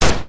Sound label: High Power Bullet Fire; gamerip; blastmorph pinball